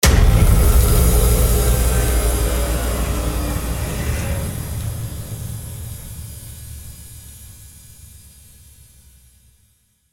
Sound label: golden underworld rain